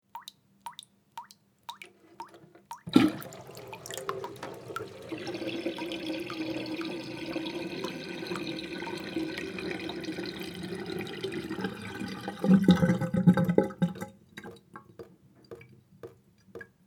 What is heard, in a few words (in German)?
Wasser ablaufen mit Tropfen